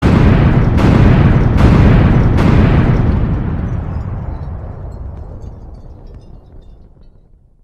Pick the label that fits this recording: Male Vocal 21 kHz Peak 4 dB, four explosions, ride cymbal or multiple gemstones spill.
four explosions